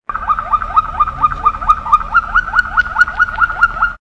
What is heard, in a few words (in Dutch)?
klokkende waterslager malinois